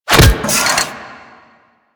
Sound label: RPG Knockback Flesh Impact; Hellion Elite